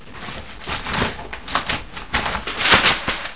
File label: paper